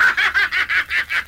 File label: laugh lucifer